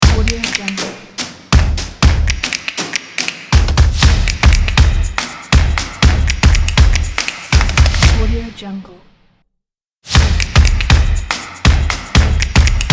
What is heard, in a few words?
groovy stomp logo